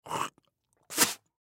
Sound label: Human Spit; Hock Spit Male Fifty Yrs; Spitting; Blastwave